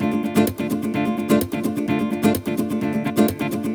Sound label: Nylon Guitar D min, 128BPM; Vengeance Samples; VEH3 Nylon Guitar Kit 1